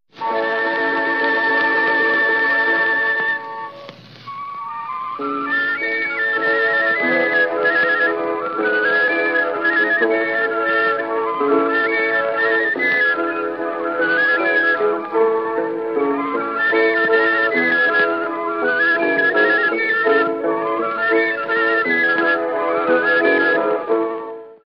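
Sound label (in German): Historische Flötentöne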